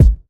solid kick g sharp key, Royality free kick single hit tuned to the G# note. Loudest frequency: 138Hz